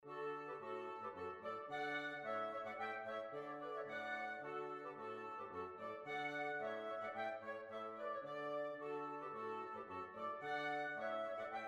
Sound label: Heel and Toe Polka